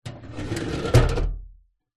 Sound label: office metal drawer close; zapsplat